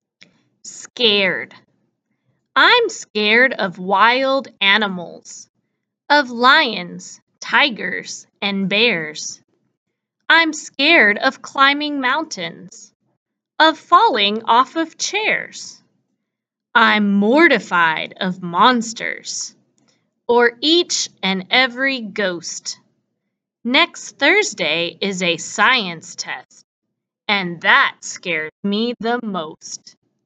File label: scared